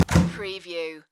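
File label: Kitchen Cabinet Door Open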